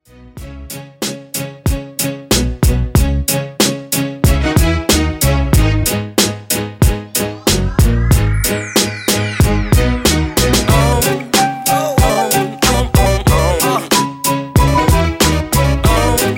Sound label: 93 Bpm; Mashup